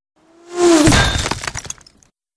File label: Bullet Impacts Hitti, stone; Bullet Impacts Hitting Masonry With Whir In And Debris Ver 7